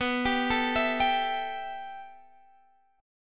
B 7th Suspended 4th Sharp 5th

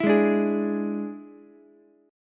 D Suspended 2nd Flat 5th down Ukulele; strummed

